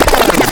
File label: backscratch, scratches; Zero-G; Freak Beats